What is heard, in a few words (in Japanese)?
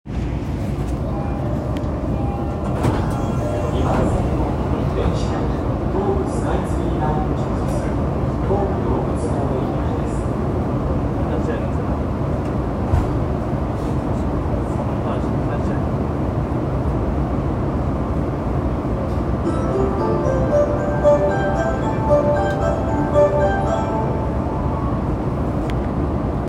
日比谷線中御徒町北, train sounds